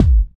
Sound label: Kick, Kickdrum; Equipped Music; Loungin House